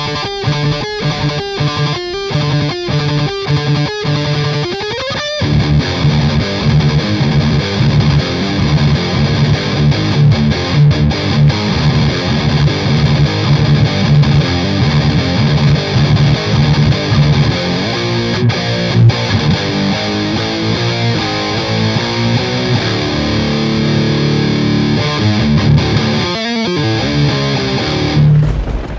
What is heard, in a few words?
Guitar Tone